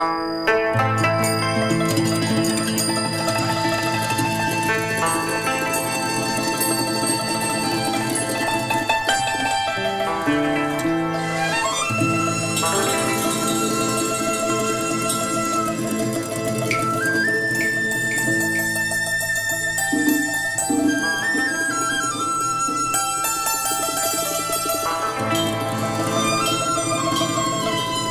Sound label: TRADITIONAL Chinese, Picking Flowers